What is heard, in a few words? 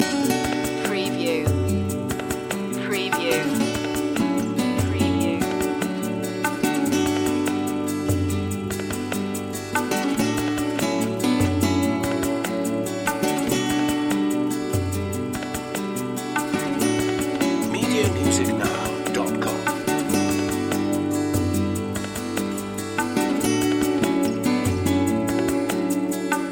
flamenco etchings loop